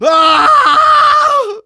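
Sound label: pain critical death; Engineer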